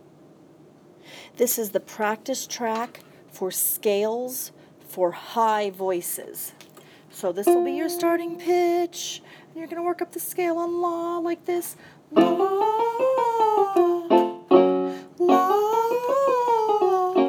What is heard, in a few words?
Practice track high scale; Practice Track Scales High Voice